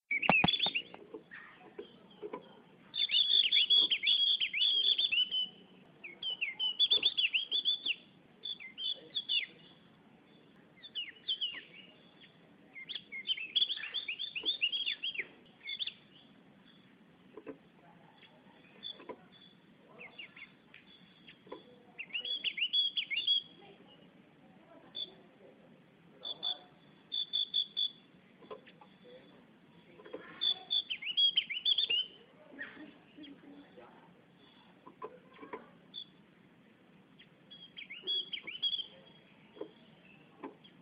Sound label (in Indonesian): opior jawa